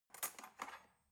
cutlery knife drawer handle ring

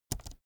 keyboard laptop space press slow soft

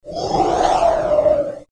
airy smooth phase

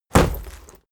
leather armor hit

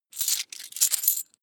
coins big clatter fast

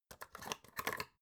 folder clamp flip

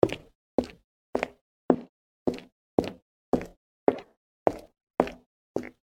steps on solid floor

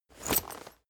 leather metal walk normal single

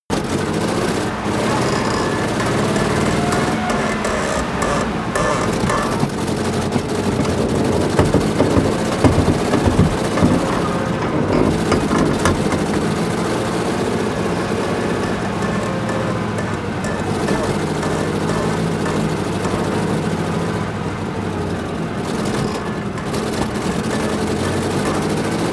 tank medium unsteady damaged

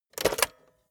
cassette player slide tray take out soft

